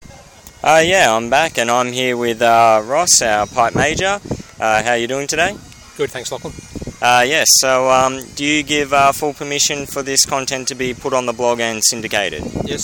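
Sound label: pipe major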